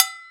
Pan; Planet Earth; Digital Sound Factory Planet Earth

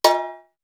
AFR COWBELL LG; WORLDPRCS; East West; Global Sounds

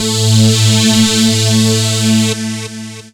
saw lead synth

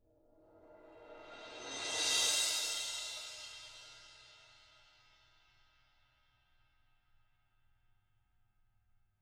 ZILDP SWELL

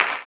CLAP, blues & soul; ILIO; Vocal Planet

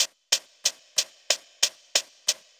SHAK; USB Soundscan vol.47; AKAI; Hip-Hop & R&B

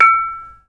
KONGWONGLEK E6; Spectrasonics; Heart of Asia

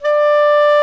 Clarinet & Bass Clarinet

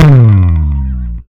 FUZZ SL, Bass Legends, SLIDES; Spectrasonics; ALL SLIDES

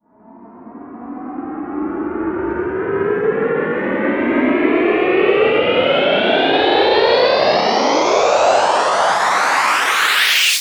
Sweeps Up, Effects

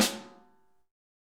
SN AMBIENT; Best Service; Real Mega Drums VOL-1

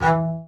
SPICCATO, ARCO BASS; Northstar - New Gold VOL-2

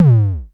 KICK, Dual Percussion Synthesiser; Klone

